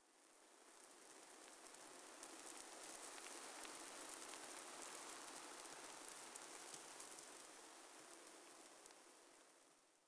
玄武岩三角洲碎石声; ambient

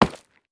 下界矿石破坏声

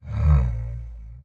骆驼环境声

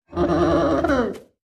嗅探兽开心声

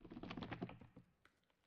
苍白垂悬苔藓声

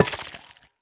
幽匿催发体破坏声

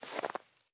细雪踩踏声

